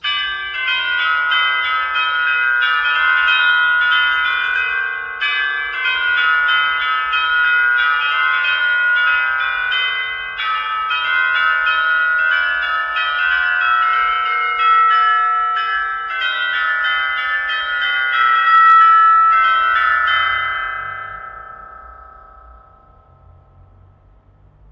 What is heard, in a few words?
Chime